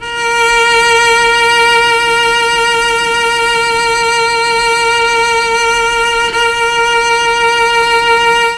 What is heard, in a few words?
A#3 CELLO, Mellotron; CHMBLN CELLO; Mike Pinder - Mellotron Archive CD